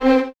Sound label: Violins C 4; Miroslav Vitous; String Ensembles